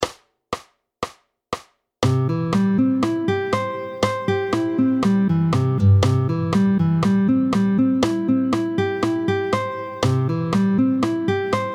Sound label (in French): Arpège de Do majeur. Vite, tempo 120; arpèges au médiator; Exercice chapitre 8 page 40